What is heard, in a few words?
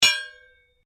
Strike Metal On Metal Heavy Ring